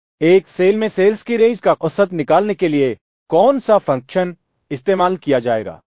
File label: techno